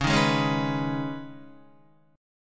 Db 7th Suspended 2nd Db, down, Guitar; JGuitar

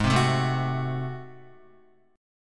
G sharp 7th Flat 9th G sharp down Guitar, chord; G#7b9; strummed; JGuitar

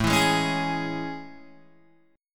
A Major 7th A, Guitar, Open G

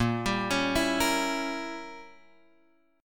A sharp Diminished 7th A sharp; A#dim7 Chord {6 X 5 6 5 6} arpeggiated

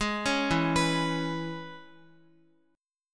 E 6th E, Guitar; arpeggiated

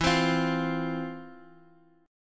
F 5th E, chord; F5/E strummed

